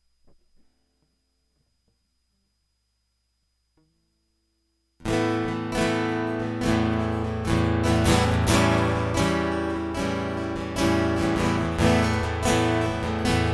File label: Resilient Guitar Prequel